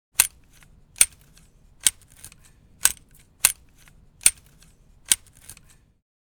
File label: Grass shears garden multiple snaps